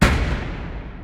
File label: Slamming Sound; Transitioner 1.0; DoorTranFat